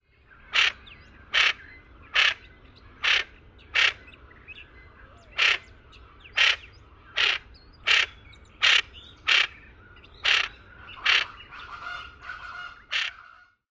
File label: alarm calls; TX